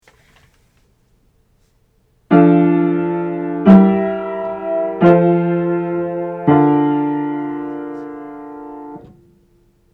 Part Writing First Inversion Triads Aural Training exercise